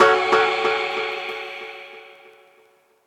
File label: dubstep club piano